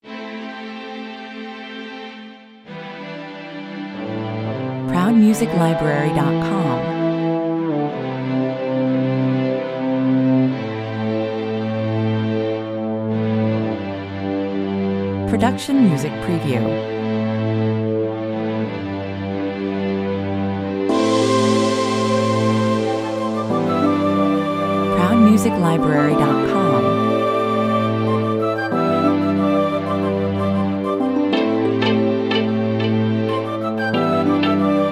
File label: tokyo sunset (bed, no drums) 92 bpm